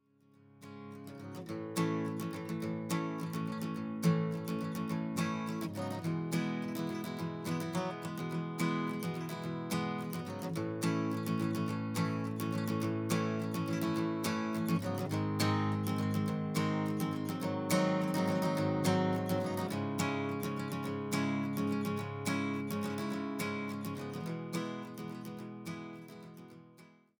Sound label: guit